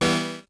Chord Crush; Lost Tapes From Mars

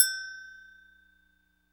AGO, ETHNIC PERC; Roland LA

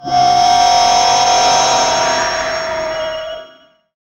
FX Dance

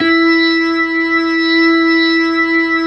DIRTY E4, DIRTY ORGAN

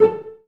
CL PIZZ A3, Advanced Orchestra; Best Service; Peter Siedlaczek's Advanced Orchestra

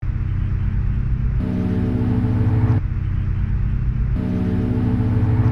MUSICLP; neuro; Stanza

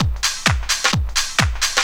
drum 130 trance

